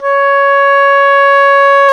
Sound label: oboe, Roland Orchestral Winds; Roland LCDP04 Orchestral Winds; Oboe Brth 1